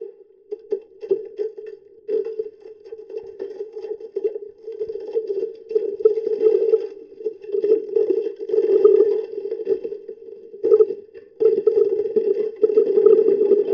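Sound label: anchor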